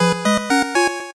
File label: 8bit se